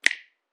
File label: Snap; Cymatics; Eternity Sample Pack; Drum One Shots